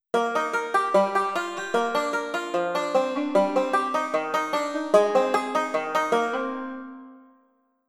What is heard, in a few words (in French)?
Generique Banjo